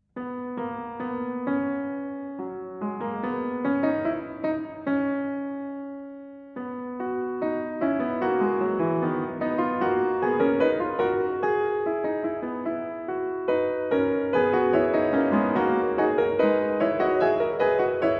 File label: Fugue in B Major; Prelude & Fugue in B Major, BWV 868